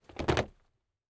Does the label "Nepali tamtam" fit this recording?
No